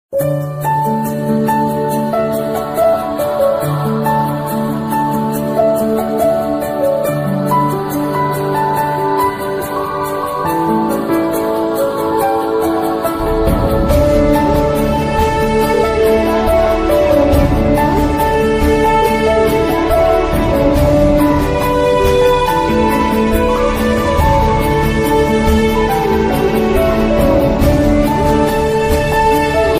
emotional ringtone sad bgm mood off ringtone sad bgm; ms dhoni